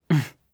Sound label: laugh, JPN; Eternal Return